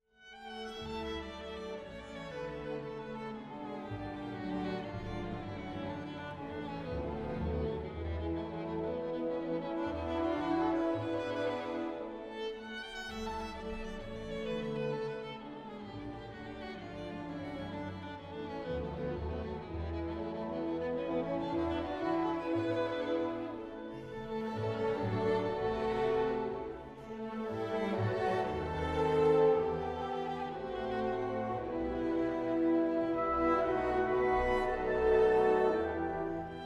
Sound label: Violin